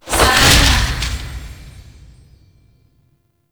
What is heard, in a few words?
Omikuji Charge; Warframe; Koumei